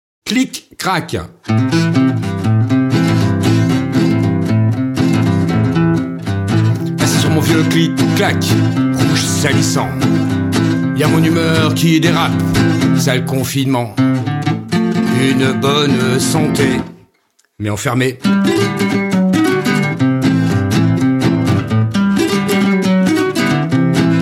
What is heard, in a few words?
Clic crak